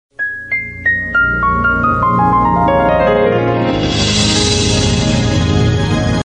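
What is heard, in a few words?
fanfare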